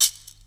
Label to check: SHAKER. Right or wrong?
Right